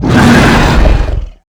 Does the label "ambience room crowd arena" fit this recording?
No